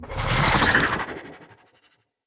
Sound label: Staff Proj By; Weapons; Enemy; Staves; Mnd Wazard Staff